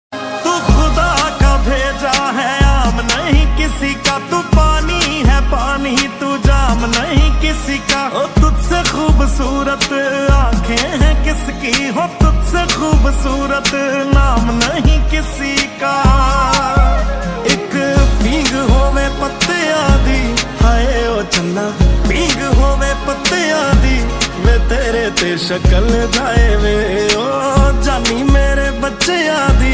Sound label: panjabi ringtone